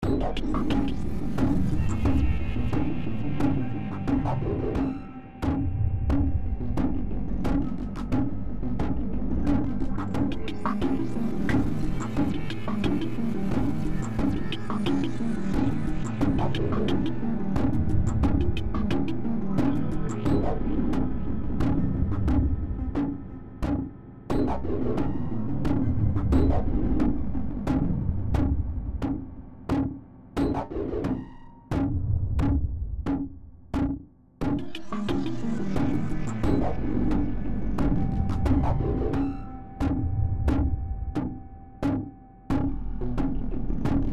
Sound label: sinister techno